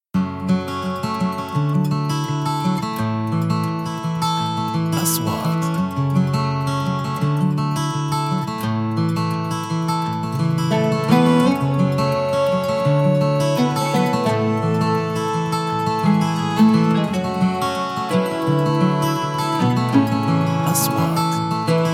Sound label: Shrooq Music